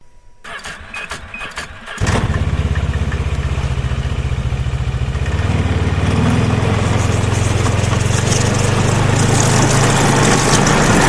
Snowmobile Ski-doo engine starts engine howls drives away; your free sounds